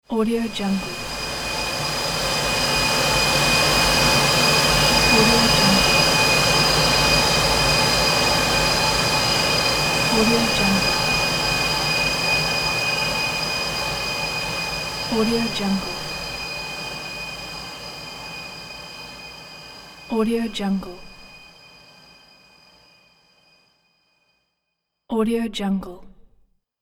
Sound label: dark world of magic